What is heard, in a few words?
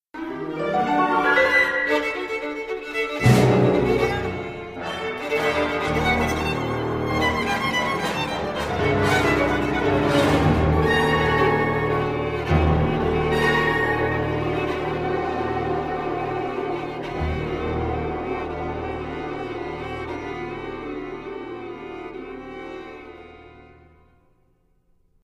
fiddle Music